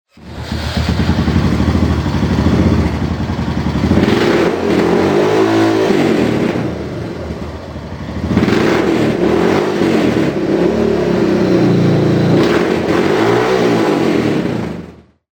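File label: rubicon, exhausts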